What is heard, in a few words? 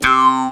Talk Box LOW L